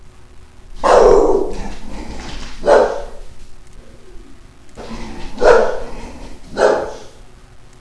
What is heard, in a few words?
Bark Door, dog